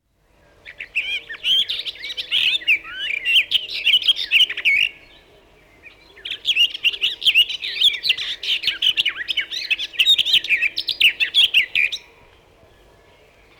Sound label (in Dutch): Tuinfluiter; De Vogels